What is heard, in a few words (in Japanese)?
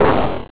zuza